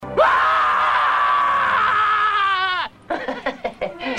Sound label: scream; TEXAS CHAINSAW MASSACRE II; "Aahh!"